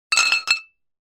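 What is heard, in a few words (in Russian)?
бутылки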